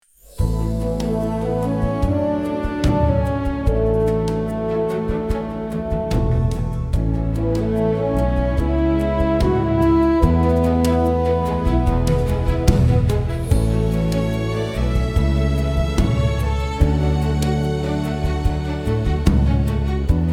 forest music background film music pop calm orchestra